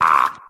"ah"

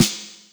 Snares, Real

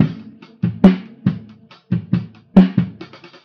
filtered as fuck, Boombap drum loops

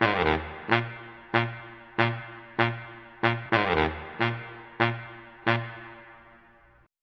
sax; Phonky

